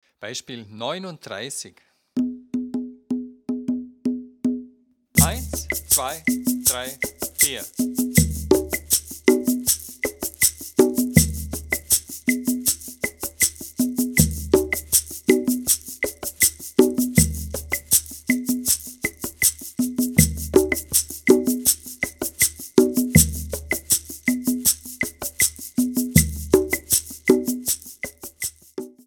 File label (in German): viererrhythmus